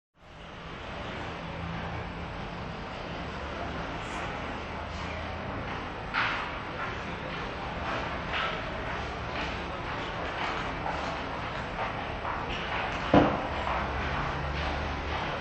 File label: Train Medium Activity Booking Hall Booking Hall In Under; Hollywood Edge 3-D SFx